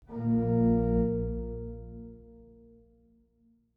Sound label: organy A#1; Apocalipsis